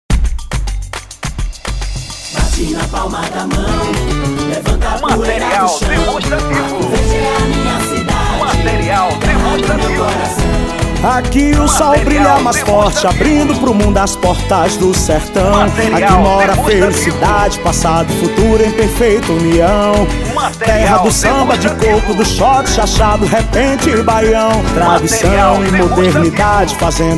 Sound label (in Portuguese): jingles; jamysom studio; arcoverde